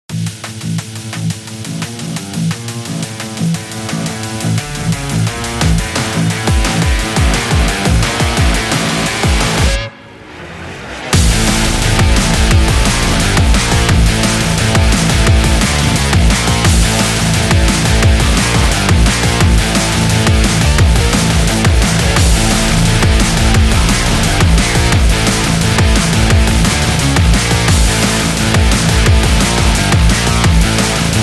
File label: Sport Drum Bass